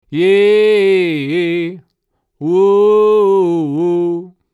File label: Vox OC818 saturation comp NUKE Mix3